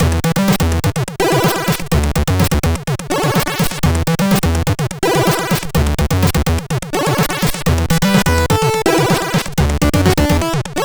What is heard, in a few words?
Melody, CSG8580R5; Melody of Unknown Origin